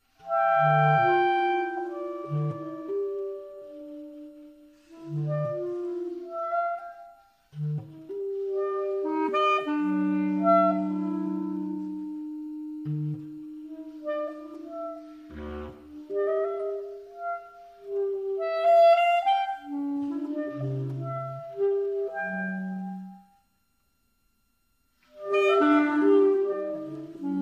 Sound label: The Clarinet Trio